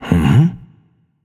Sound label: notice; Smite; bacchus